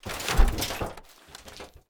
Fat Man Equip Up; ARMES

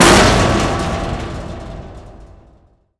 explosion impact metal

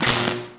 explosion robot buzz

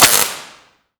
laser beam

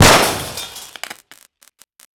fx explosion robot

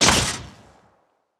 Pistol Alien Blaster Fire NPC Layer B

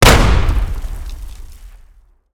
explosion grenade frag high

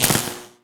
explosion robot buzz

